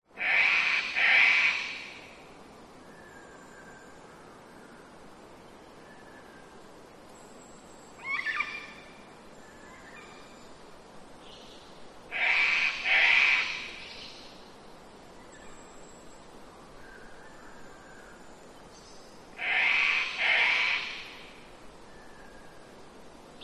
nature rainforest ambience wind trees birds mainly cockatoos close by; early spring; qld; zapsplat